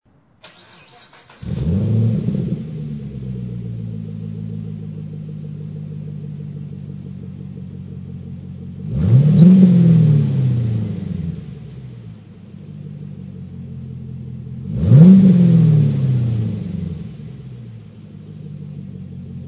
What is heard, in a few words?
thrush Glasspack